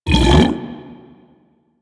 devour regurgitate; Warframe; Warframes; Grendel

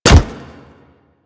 Pet Step Default; Warframe; Alad V Pet

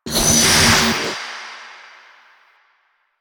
Stun Start; Warframe; Stalker

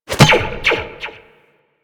Zipline Grab; Gadgets; Parkour; Warframe

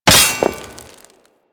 Dagger Stone Impact; Dual Daggers; Hit Effects; Weapons

